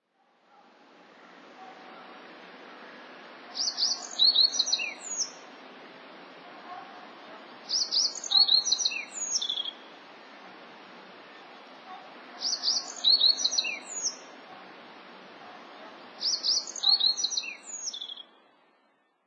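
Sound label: yellow bunting, nasu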